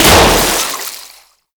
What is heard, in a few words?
Balloon Pop; Festivities; Warframe; Follie